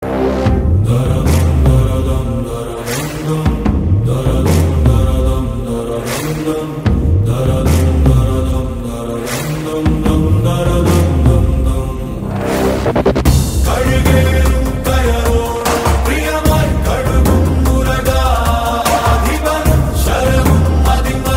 Malayalam; RRR

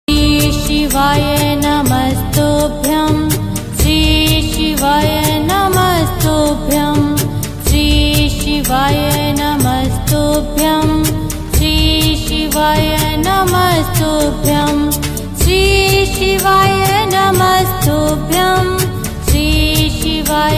mantr; shri shivay namastubhyam; bholenath

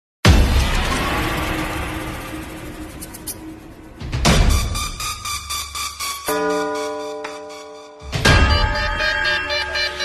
attitude ringtone; KGF chapter

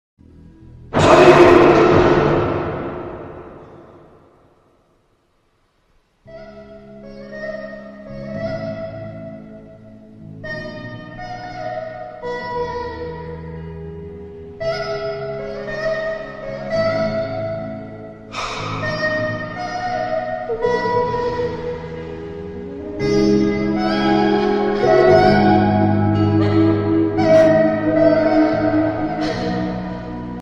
sad bgm heart touching bgm; khadgam; failure bgm

